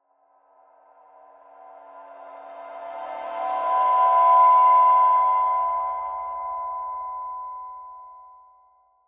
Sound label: Cymbal Swell High; Blastwave FX